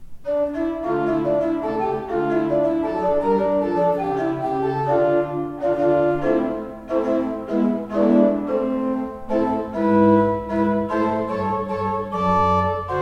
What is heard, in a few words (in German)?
Van Rossum 10 II P, orgel